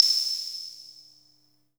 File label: house drum; AKAI S6000 CD-ROM - Volume 1; DRUM RYTHM